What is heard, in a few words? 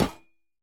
hit, copper statue